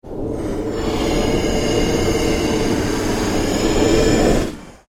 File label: Ambient Pulse Ghost Chatter